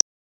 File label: hover, cursor